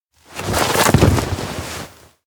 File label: stagger fall; anemoi